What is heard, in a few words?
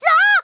hit; lisa